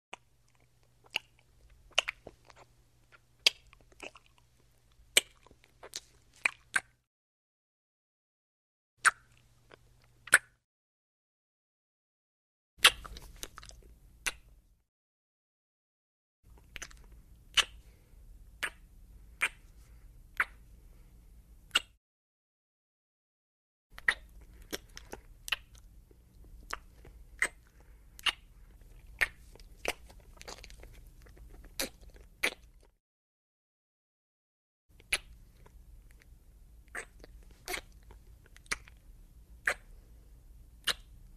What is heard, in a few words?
Human Heavy Wet Kissing; Hollywood Edge Foley Sound Library